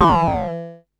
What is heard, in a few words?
Perc Synth Raw